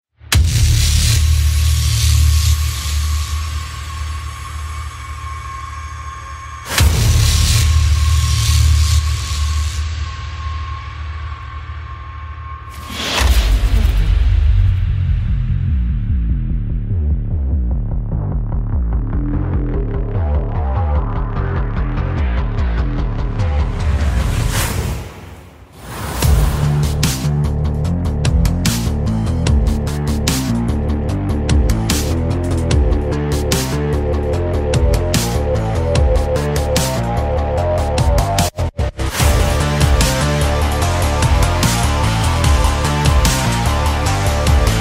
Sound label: Aggressive Electronic Industrial Rock, Trailer Music